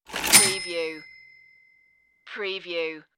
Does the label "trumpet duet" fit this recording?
No